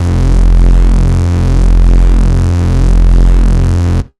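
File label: bass sustain F; Polyend; ARC Noise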